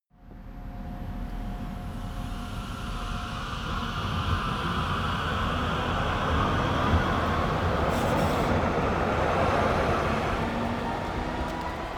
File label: train subway new depart; bahnverkehr u bahn